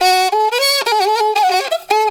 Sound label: riti grooves; Best Service; World Colours